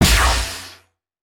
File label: Barrier Shock, ambience spaceship